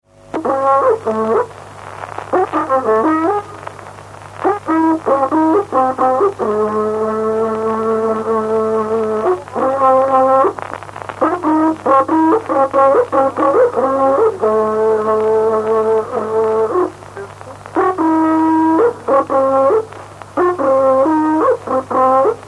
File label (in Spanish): Toque de trutruka; Música Mapuche